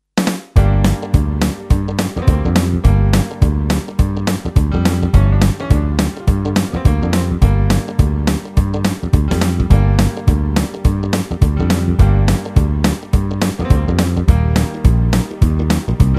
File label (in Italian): Karaoke base musicale